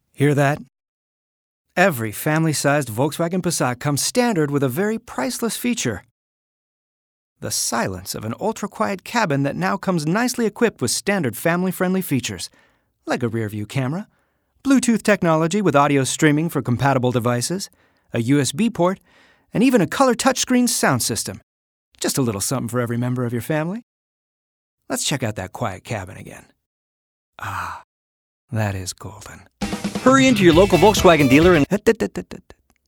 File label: VW Radio Passat Silence